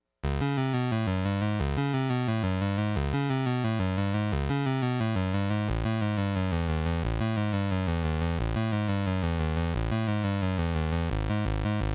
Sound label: Techno